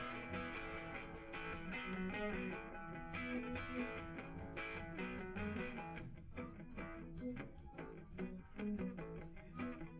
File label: drums live rec 250ms 5cm; SiSEC11; underdetermined